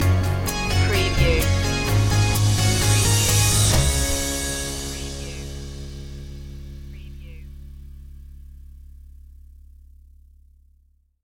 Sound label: hybrid throwback stinger